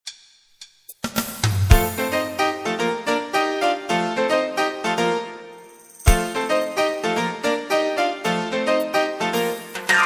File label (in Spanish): ritmo caliente